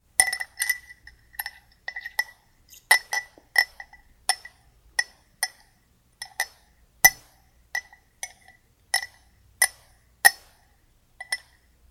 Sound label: food drink ice tinkling in empty glass; sound effects one